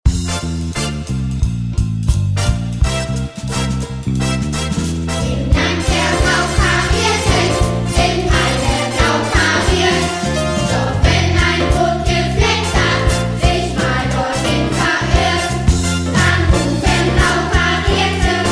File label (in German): Chor